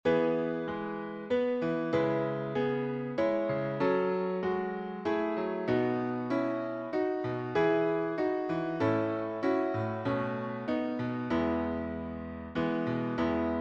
Schriftlied Bas

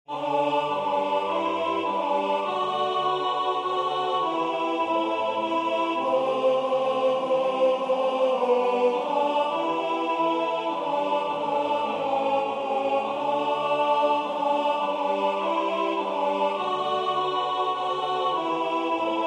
De dag door uwe gunst ontvangen A; scholefield

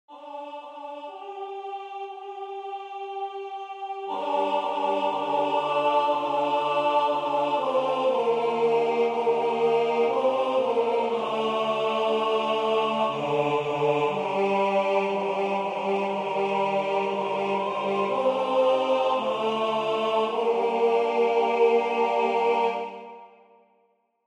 laat ons bidden T

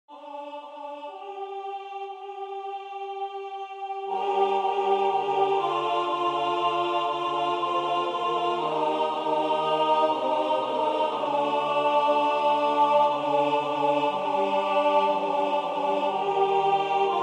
laat ons bidden A

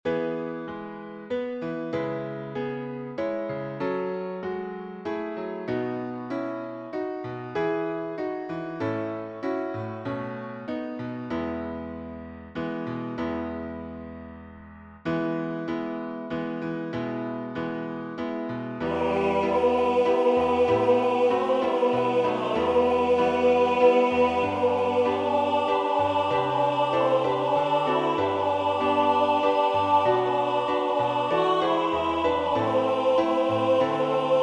Schriftlied Tenor

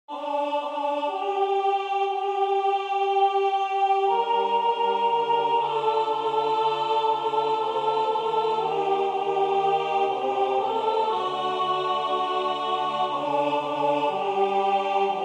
laat ons bidden S

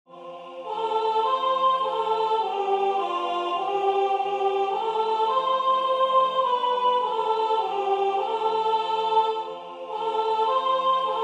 Ave Maria Sopraan

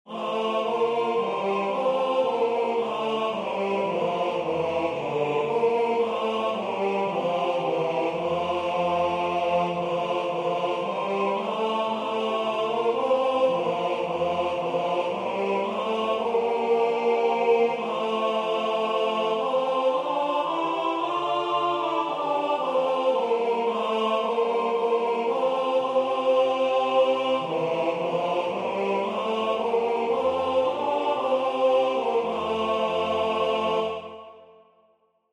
Het lied van de dag des Heren tenor

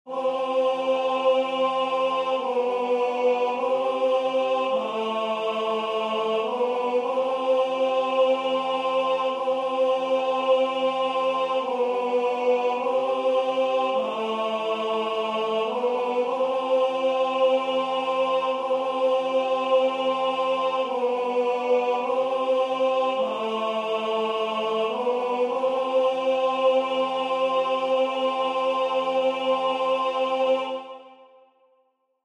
Ave Maria Tenor

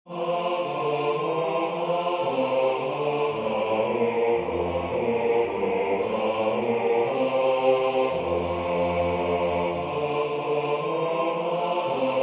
Het lied van de dag des Heren bas

